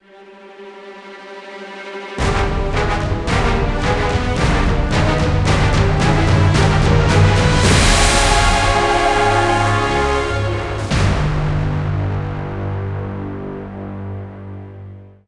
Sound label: Relic Repair Sting Tempo Synced